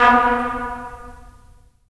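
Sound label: brass trumpets staccato, right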